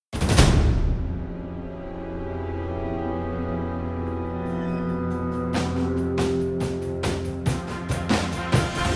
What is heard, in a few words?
boss fight intro; TinyKeep